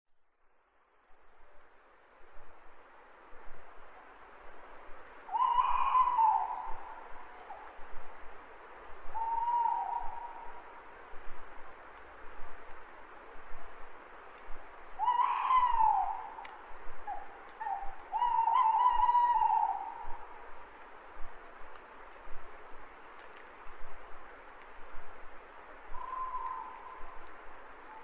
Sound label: owls